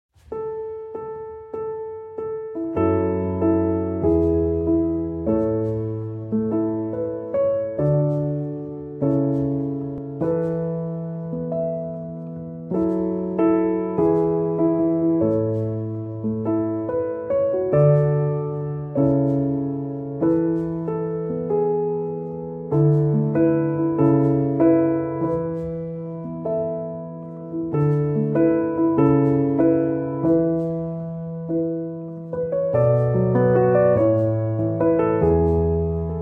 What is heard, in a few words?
Piano Instrumental